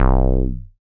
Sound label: SYN TB SUB, Roland TB-303